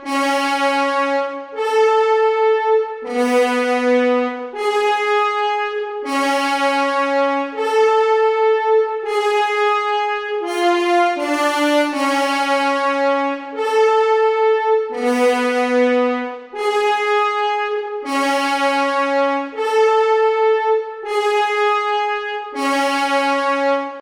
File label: French Horn